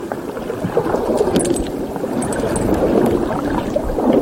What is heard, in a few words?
close key jingle; Biggs; biophony